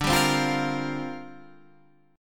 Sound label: C 7th Suspended 2nd Suspended 4th D